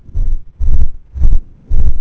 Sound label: Dwemer Fan; The Elder Scrolls III Morrowind GOTY; XBOX